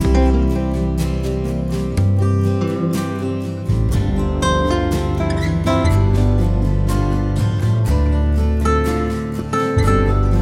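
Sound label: Calm morning music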